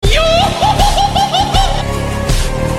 hohoho; brook

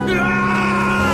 scream; flippy

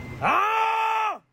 aaaahhhh

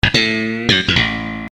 sitcom transition bass riff; seinfeld

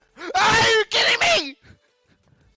audio alert

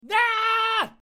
scream

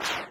blade swing; rotmg; swordblade

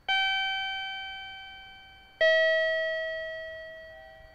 train station chime; jr

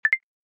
intercom notification

